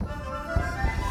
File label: Music Main Amorce 108bpm; Act Armorica